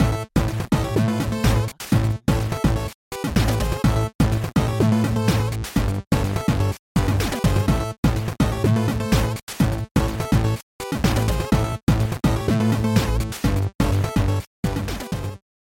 Logo Ident 8-bit Groove; SoundsCrate

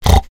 animal pig

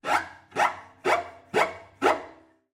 Cello Psycho Screech Several; SoundsCrate

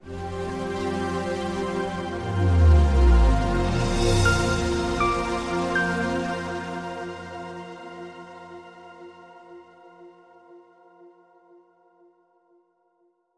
Corporate Ident Company Logo; SoundsCrate

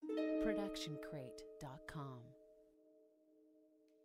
harp mystery; soundscrate

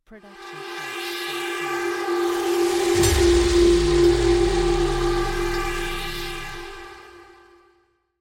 designer magical hit; SoundsCrate

